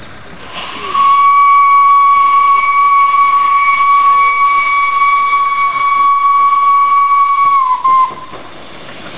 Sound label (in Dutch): Beton fluit